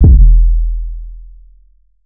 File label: 808 one shot E; Kettle; Slate Digital BASSHOLE Sample Pack